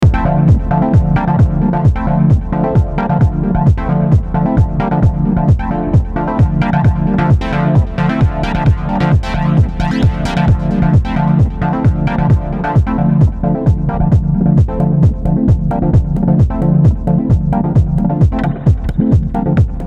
testing out the chord stab and fx capabilities of Opsix, OT drum samples, kick is garbage; OT drum samples, Opsix native plugin, Ableton Live 11 compression